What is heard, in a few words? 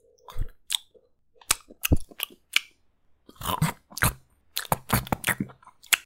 Comic Cartoon Eating